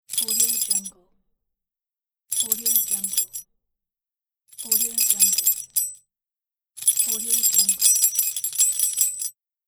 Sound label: keys rattle